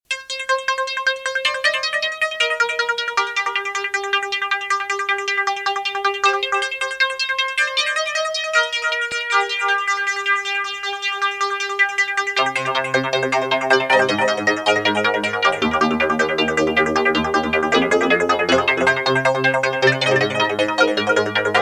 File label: PLUCK ARP